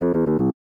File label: fail, jingles; gbjam12